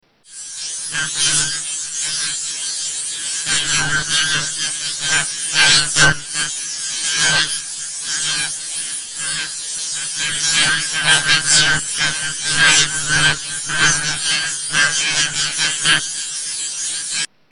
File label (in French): Onde 28khz rendue sonore en 8khz